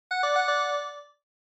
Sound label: Quiz Ding Dong Short